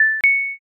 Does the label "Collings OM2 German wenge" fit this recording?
No